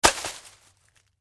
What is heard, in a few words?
Stick Drop Leaves, Foley Stick Drop Leaves Woods Forest; Forests and Jungles; Blastwave